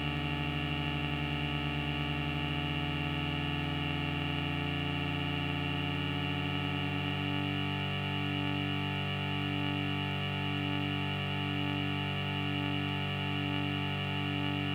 harmonic drone build up, weird sound generator samples